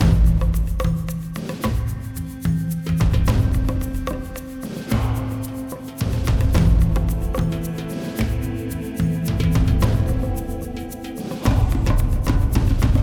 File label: Garden Stem Test LOOP